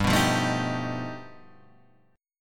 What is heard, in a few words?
G Augmented 9th